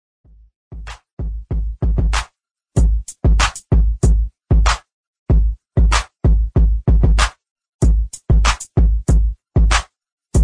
hip hop beat ringtone; iphone 7 plus

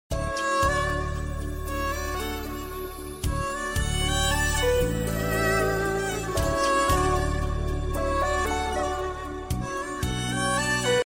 malayalam melody ringtone

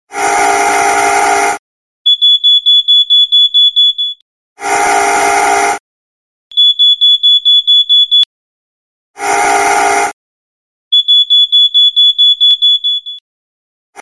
samsung new alarm mix ringtone

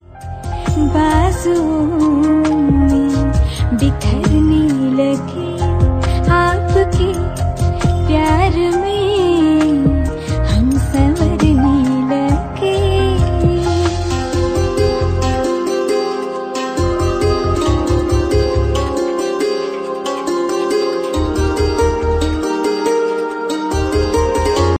new vietnamese love ringtone

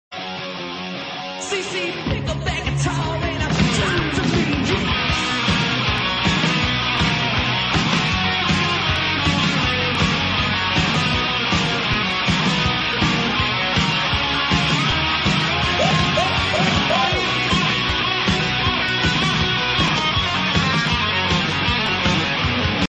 rock ringtone; iphone 3g s

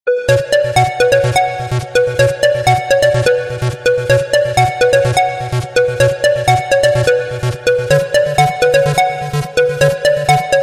apple iphone i phone 7 ringtone

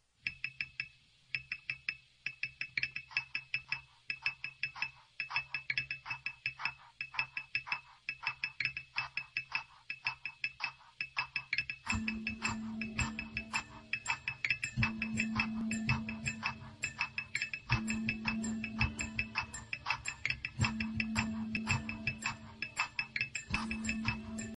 iphone 7 rock ringtone

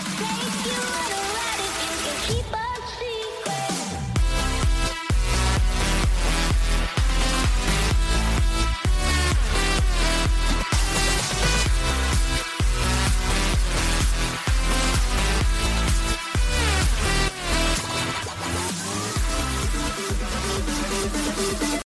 remixs ringtone; iphone xs max

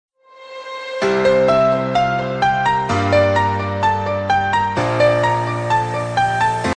samsung new sms tone ringtone

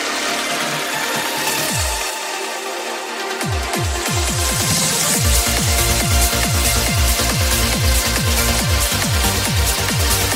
ringtone; i phone se

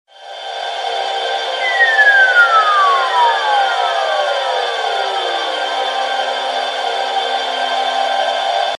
nokia portamento ringtone

